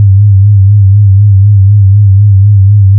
product, This effectively creates vibrato effect when the rate of modulation is slow (LFO).